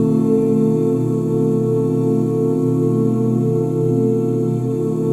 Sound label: OOH B FLAT5, OOH CHORDS, Vocal Jazz; ILIO; Vocal Planet; Jazz & FX